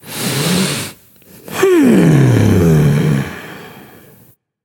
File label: breath, voicepack; smite; jorm